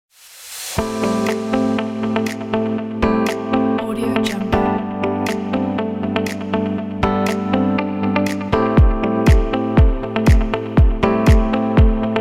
corporate fashion lounge

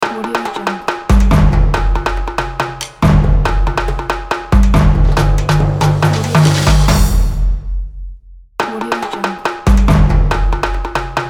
tribal drums logo

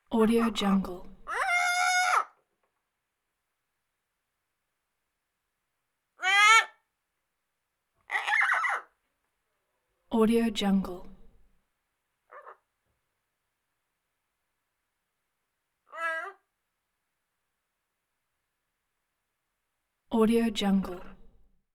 pet parrot squawks; hollywoodedge

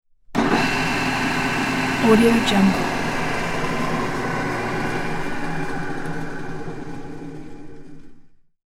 drill press; chicasound

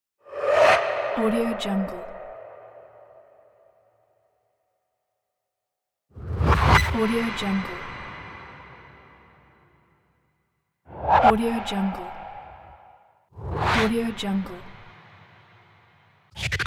synth transition whoosh swish

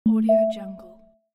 menu

